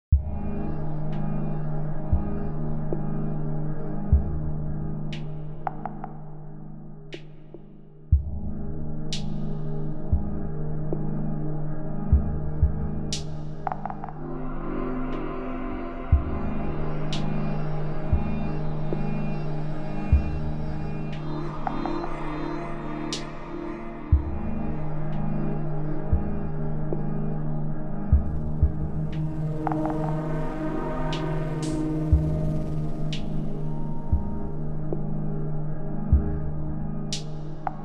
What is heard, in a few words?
uncomfortable ambiance; weeklybeats 2026